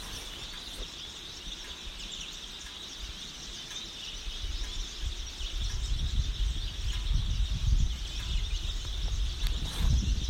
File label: Gold finch flock